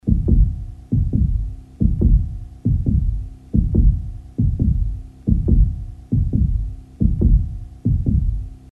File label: Heartbeat